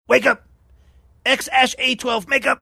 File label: singing wake up